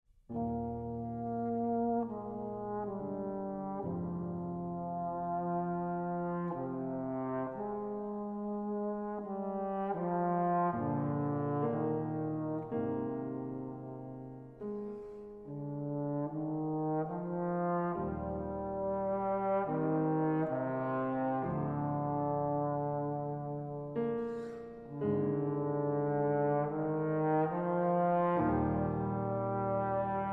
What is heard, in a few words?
Sonata for Cello and Piano